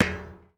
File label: Street Ball Metal Slap; SFX Kit